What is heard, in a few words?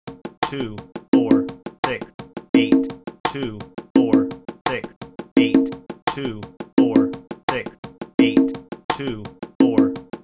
conga count